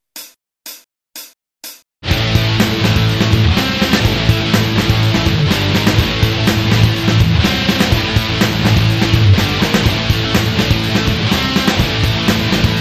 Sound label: jam